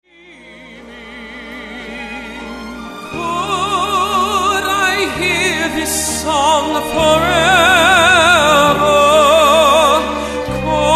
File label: tenors